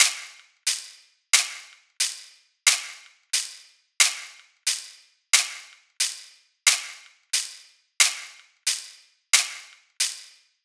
Trap 90 Shaker; Drum Construction Kits; Loops; Ghosthack; Drum Mega Bundle Demo; Drum Hero 5; Trap 1

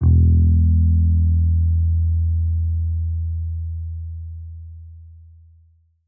Bass Guitar Shot, E; Lofi; Cymatics; Lofi Starter Pack

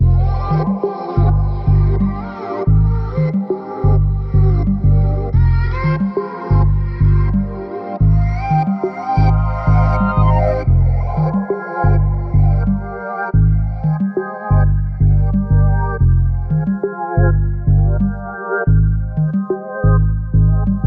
90 BPM C Min; Cymatics; Zodiac III Beta Pack; Zodiac III Production Suite

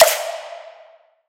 Snare, E; Snares; Future Bass; Drums; One Shots

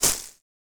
Footstep Grass Heavy

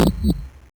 Retro FX; Cymatics; Video Game Sample Pack Vol 1

